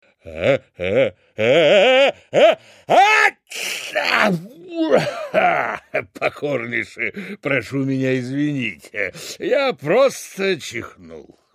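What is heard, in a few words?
sneeze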